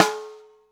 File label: SN, Drum Machines